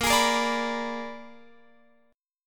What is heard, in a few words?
A sharp 7th Flat 9th A sharp down Guitar Open F; A#7b9; strummed